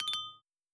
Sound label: Wine Glass High